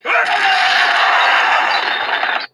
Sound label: bursts though wall; cookie monster